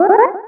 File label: Sword Charge; Charging up a Sword; Zelda